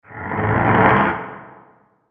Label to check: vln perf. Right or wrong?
Wrong